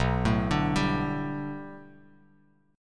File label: B Minor 7th Sharp 5th B Bass Standard, chord; 7,12,12,12; Bm7#5 arpeggiated; JGuitar